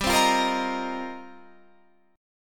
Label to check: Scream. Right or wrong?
Wrong